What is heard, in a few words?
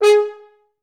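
French horn staccato G#2, Advanced Orchestra; Best Service; Peter Siedlaczek's Advanced Orchestra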